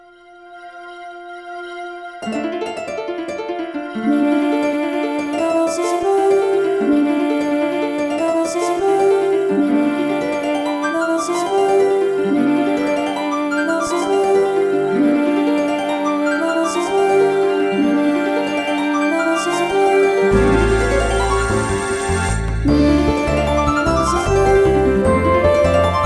With Harp; the legend of zelda skyward sword wii gamerip 2011

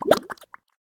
Mud Gulb; Zombie Catchers

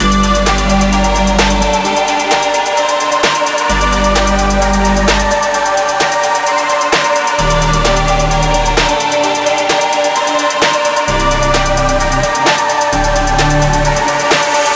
Boss floor intro

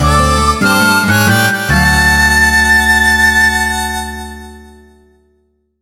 LOSE

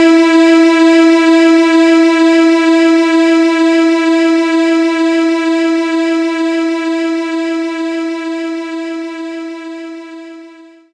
strings E4

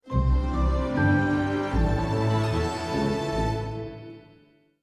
Magic Reveal

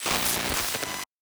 Glitch

